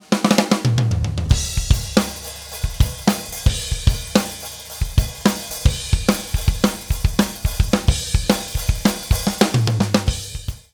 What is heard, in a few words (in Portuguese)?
Batera depois de checar a fase